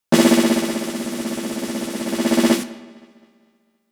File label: Drum Roll; Mario Party 2; N64